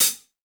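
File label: Hip-Hop Kit hi-hat; Roland Juno-D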